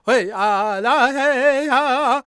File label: C# MIN, World Voices, LAKOTA